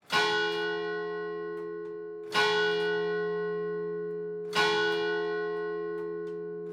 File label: Sanctuary Bell; PomoLuxe